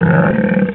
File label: VOMIT; HOP AND HURL